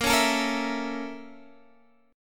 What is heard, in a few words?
A sharp Minor Major 7th Flat 5th, Guitar Half Step Down